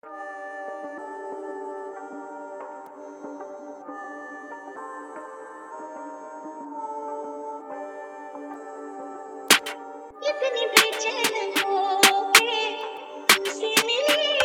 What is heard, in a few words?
drill mix